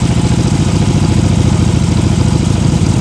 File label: cycle and sidecar; auto; Tony Hawk's Project 8; XBOX